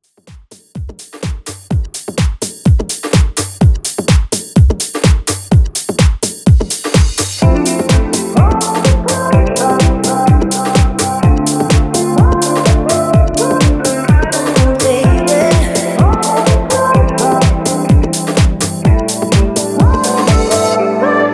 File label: Club Re Drum 126Bpm